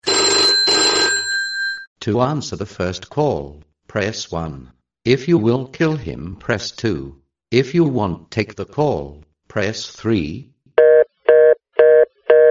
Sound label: answer the call background music tone ringtone